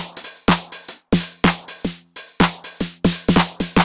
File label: drum loop ninety pop top 125, Classic Chicago House; Sample Magic; Drum Loops